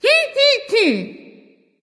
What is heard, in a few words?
tongue; smite; voicepack; neith